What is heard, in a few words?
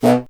C. Bassoon, solo instruments; Miroslav Vitous - Solo Instruments VOL-1